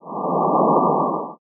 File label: smoke